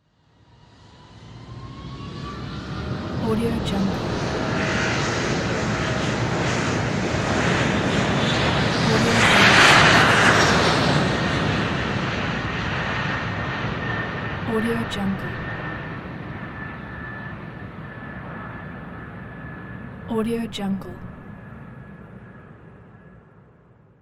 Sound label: airplane take off